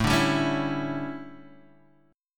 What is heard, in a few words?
D Major 7th Suspended 2nd Suspended 4th A, chord